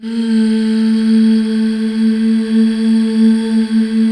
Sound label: MMHH A3